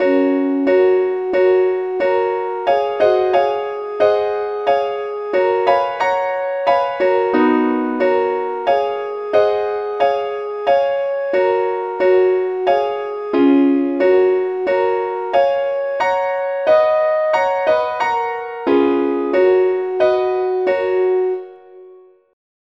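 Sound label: lead sheet, key F sharp; SAINTTHOMAS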